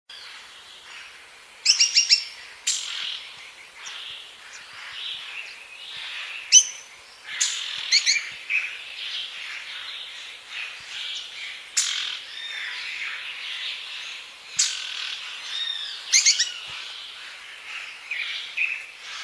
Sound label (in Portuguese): canto do patinho